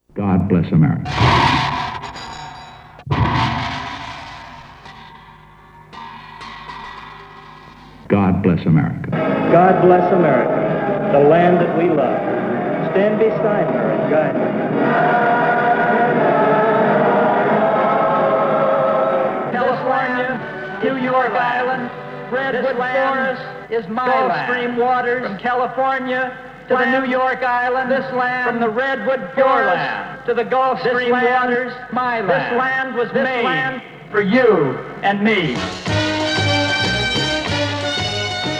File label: Radio Nova Jingle; Nova 25 ans - 1984